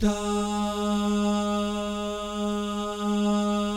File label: DAH, jazz FX, mix guy gal; Spectrasonics; Vocal Planet